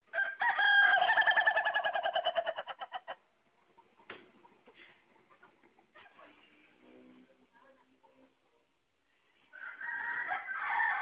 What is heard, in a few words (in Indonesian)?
jenis bunyi ayam ketawa suara geretek